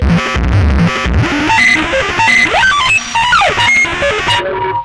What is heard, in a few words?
SEQUENCOR, Hard & Loud Techno; USB Soundscan vol.01; AKAI